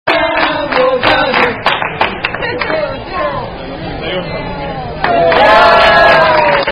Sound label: Unknown Spanish Happy Birthday